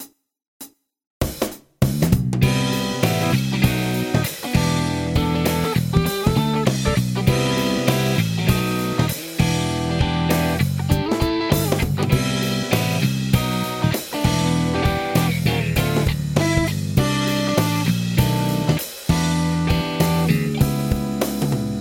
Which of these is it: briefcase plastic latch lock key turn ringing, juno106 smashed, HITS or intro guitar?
intro guitar